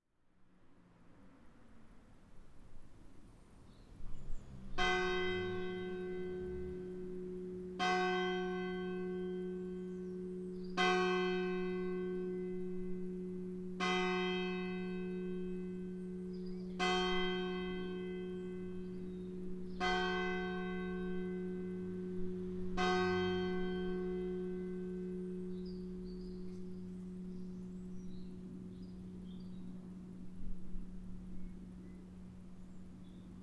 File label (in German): Petrikirche Glocken